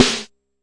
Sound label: Jomox MBrane, drum machines; Jomox MBrane 11